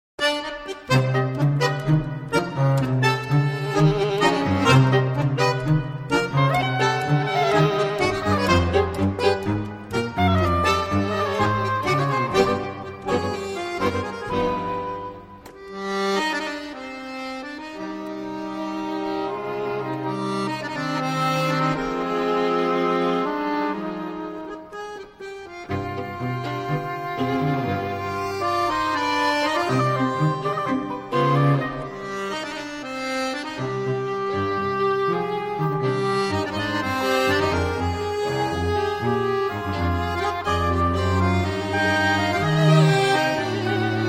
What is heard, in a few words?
Tango Solitario